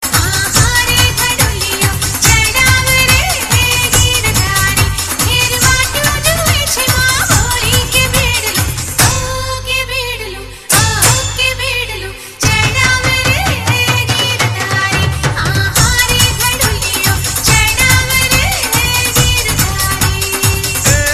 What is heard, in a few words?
Gujarati